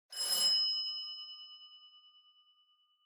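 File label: Doorbell Mechanical Bell Single Far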